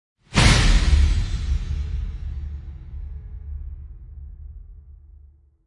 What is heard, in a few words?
Threat Orch; Gothic Storm Music